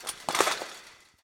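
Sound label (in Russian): падение дребезжащего предмета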